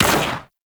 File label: Fighter Rager Fire; Grineer; Space Fighter Rager Agent